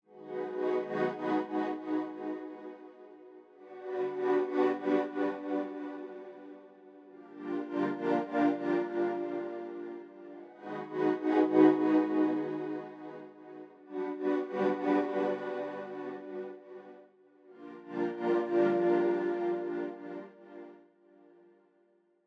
strings

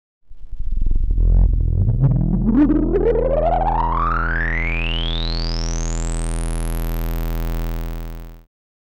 filter square sweep high res

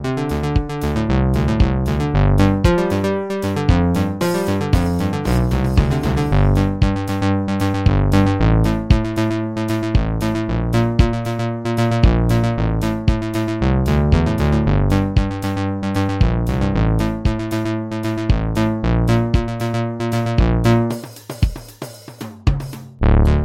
moog taurus 2 bass pedal

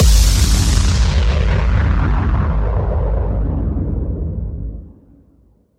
club impacts sound

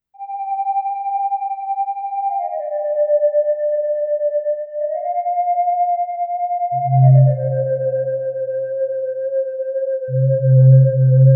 floating flute

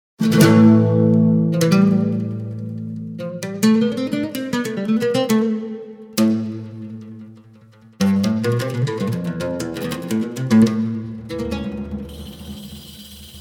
arabic oud jasmines melody

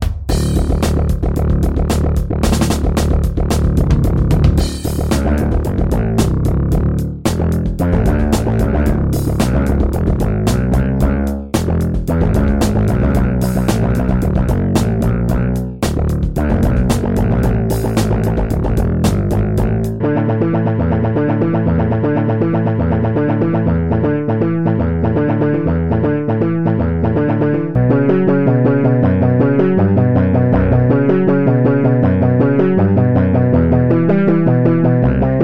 wah electric bass

